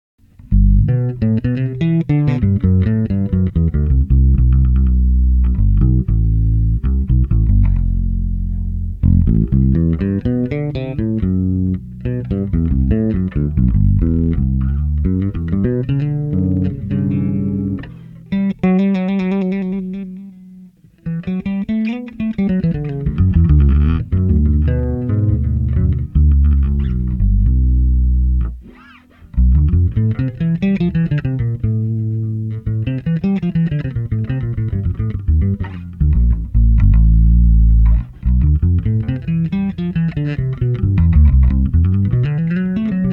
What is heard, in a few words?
improv, solobass